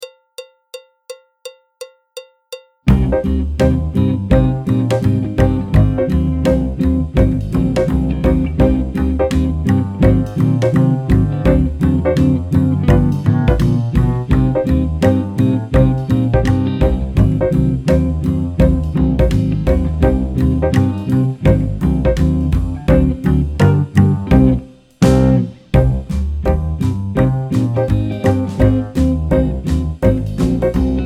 clarinet, flute; Jazz Syllabus Level 4

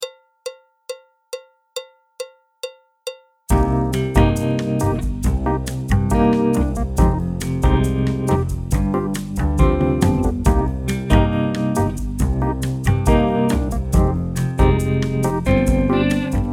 alto, trombone, piano; Jazz Syllabus Level 4

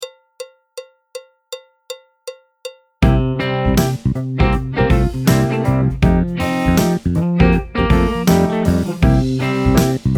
Blue Trainers BT, tenor, trumpet; Jazz Syllabus Level 4

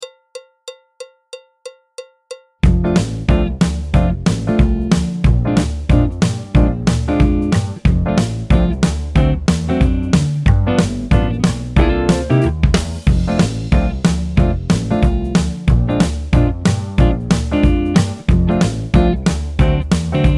Blues, alto, trombone, piano; Jazz Syllabus Level 4

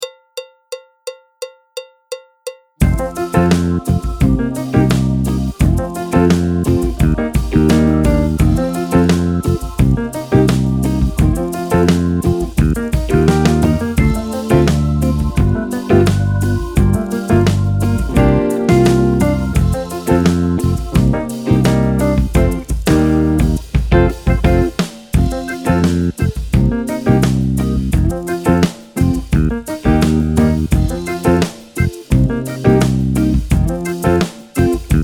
alto, trombone, piano; Jazz Syllabus Level 4

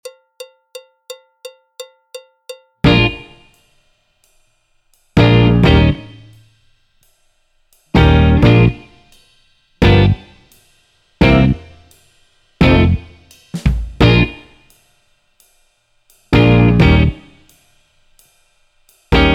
clarinet, flute, tenor, trumpet; Jazz Syllabus Level 4

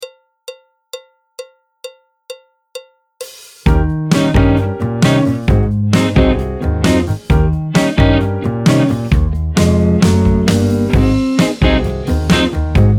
Blues, tenor, trumpet; Jazz Syllabus Level 4

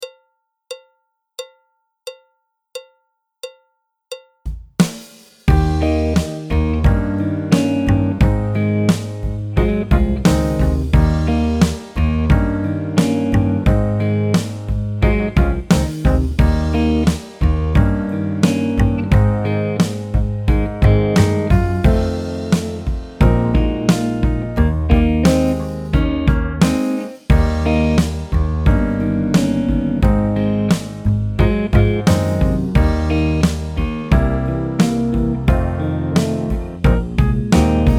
alto, tenor, trombone, piano; Jazz Syllabus Level 4

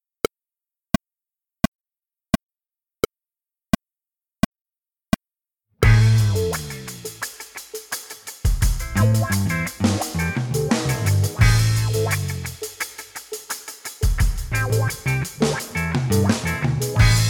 With drums

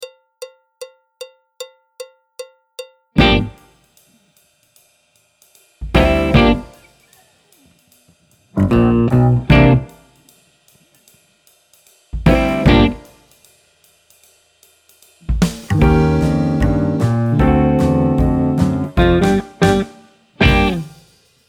clarinet, flute, tenor; Jazz Syllabus Level 4

